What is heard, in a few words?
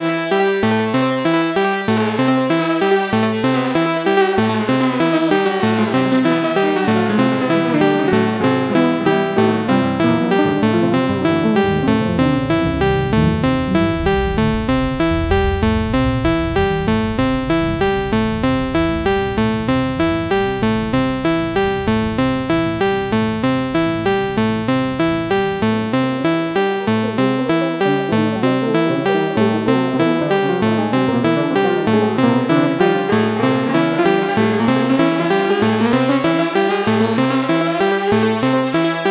Bastl Thyme HiFi Tape